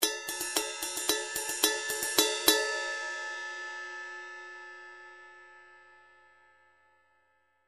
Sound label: Zildjian ZBT20R